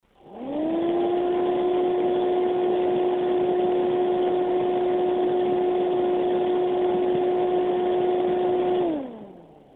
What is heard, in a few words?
gbt ghpcu23ve hi